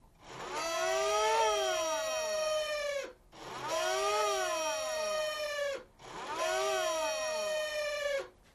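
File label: Hand Turn Siren Malfunction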